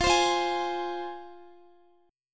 F Minor, down, Guitar; strummed; 4F,4A,5C,5G,5C,6E

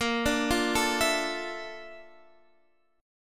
A sharp Major Sharp 11th, Guitar, Standard; A#M#11; arpeggiated; x,13,12,10,10,12; down; capo=9

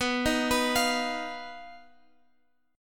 B Major Flat 5th B Guitar Open G

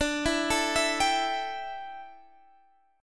D Suspended 2nd Suspended 4th D down; arpeggiated

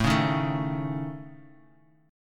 A Major Flat 5th, Bass Standard; strummed

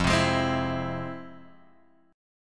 F Minor Major 7th, Guitar Drop D, chord

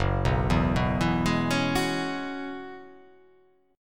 Gb 7th Suspended 2nd Suspended 4th Gb down; arpeggiated; JGuitar

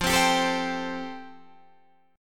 F Major Add 9th, Guitar Open D

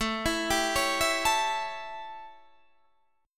A 7th, chord; arpeggiated

